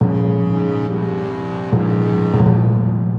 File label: LOSE, fanfares